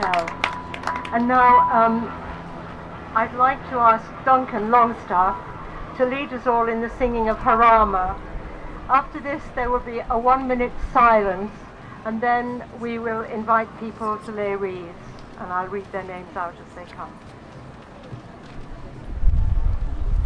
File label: singing jarama at spanish civil war international brigades commemoration; jubilee gardens